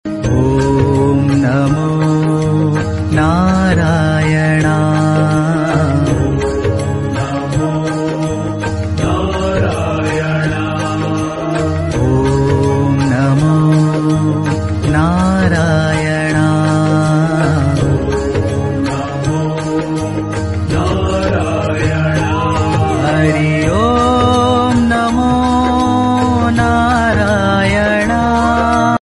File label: Om Namo Narayana Ringtone Male Version; ringtones